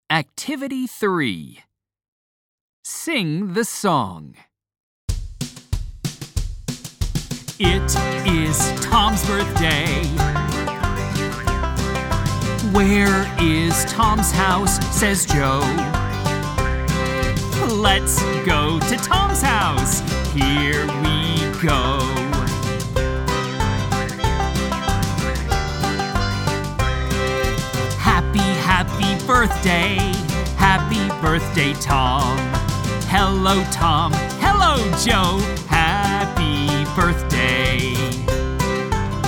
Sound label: Birthday Song